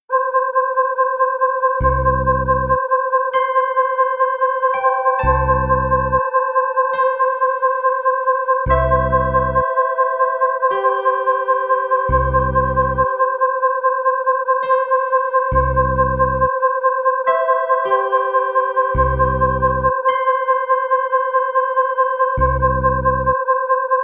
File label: General MIDI; SimFarm; Microsoft Windows Version; Alien